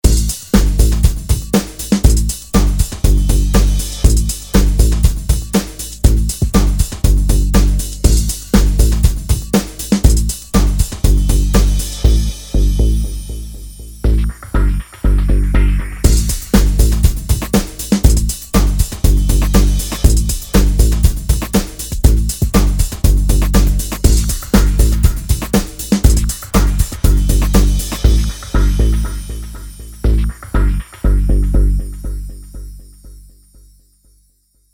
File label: Bass Line Runner Rhythm; SHORT BUS